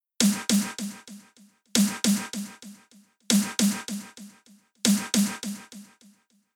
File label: Delay